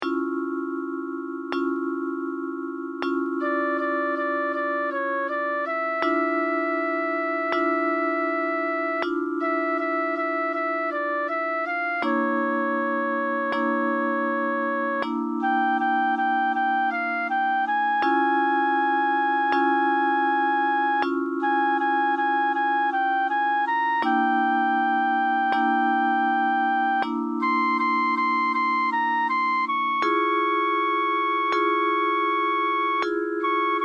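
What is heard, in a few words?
images flute & guitar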